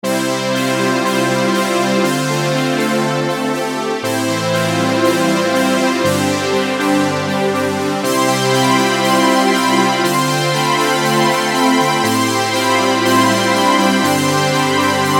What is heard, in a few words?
EZkeys 2 Synthwave; Nova